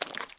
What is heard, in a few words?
mud walk, step